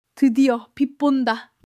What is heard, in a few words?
laughter